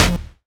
Strike

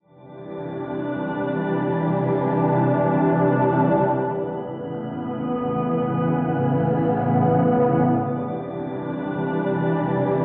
pad; heavyocity gravity

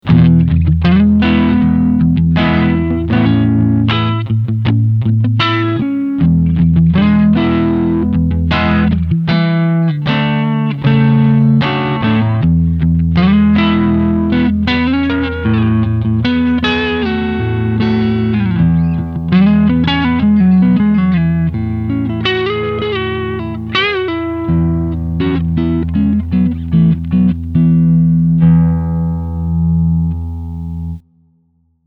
Le Clean Kanal B full gain; two notes

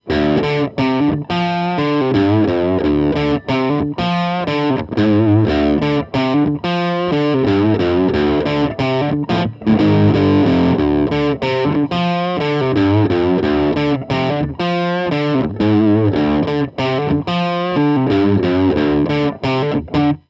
crunch shure sm57; klang sdm-57

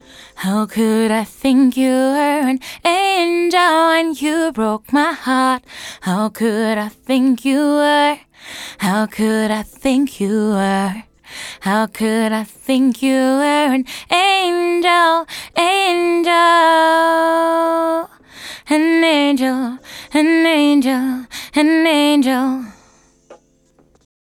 vocals dry; eventide 2016 stereo room

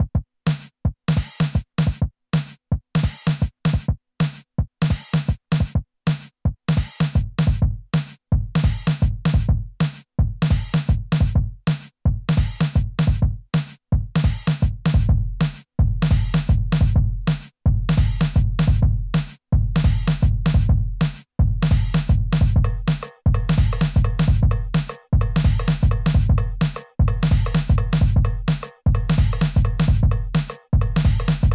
korg volca sample

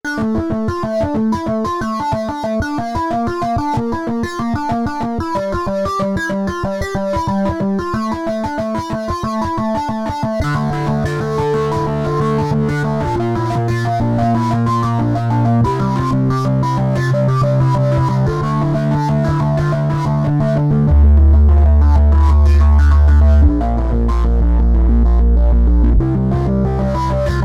Waldorf Quantum test lead

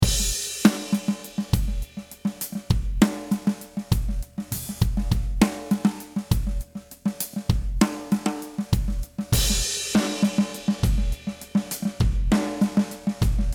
psp echo drive

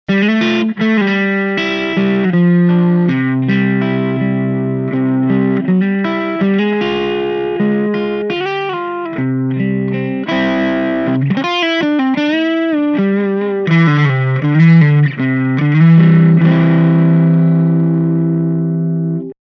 vox ac10c1 crunch lead

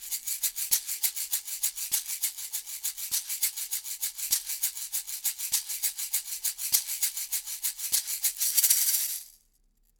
shaker; sennheiser md21

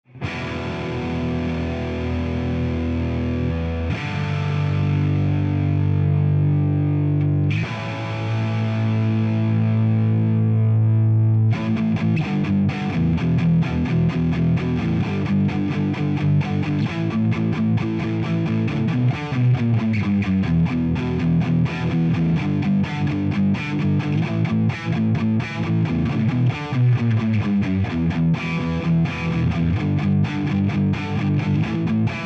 Spark Rhythm; mercuriall

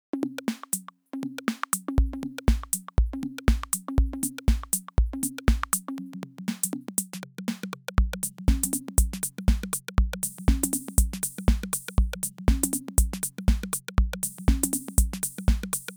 musicloop; presonus goldbaby essentials collection test

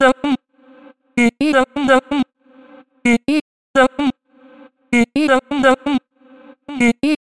Vocal Loop; Zero-G Vocal Lix

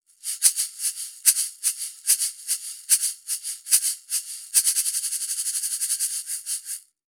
Rode Podcaster test shaker

